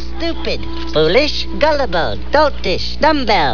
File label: fall; alienarena; johnny5